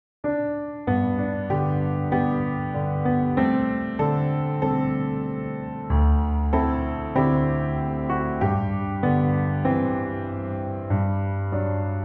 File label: It Is The Same piano